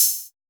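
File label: analog open hi-hat; POP-ROCK1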